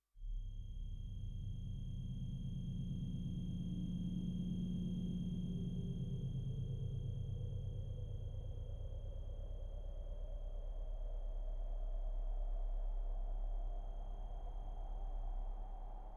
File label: 2 Timbres bip